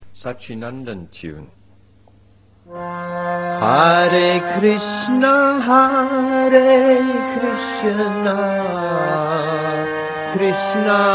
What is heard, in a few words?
tune